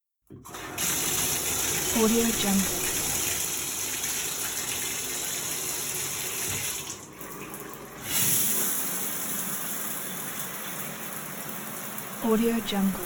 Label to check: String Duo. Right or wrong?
Wrong